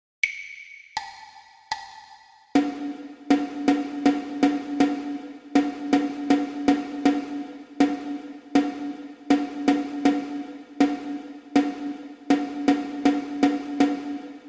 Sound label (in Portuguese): percepcao ditado ritmico